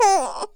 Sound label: Baby, Foley; musicradar; cartoon caper